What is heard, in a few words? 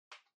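Portable Stereo Far Remote Control Button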